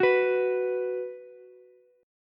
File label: B 5th B down Ukulele; strummed; JGuitar